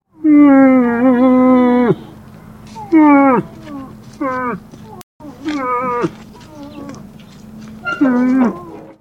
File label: Moose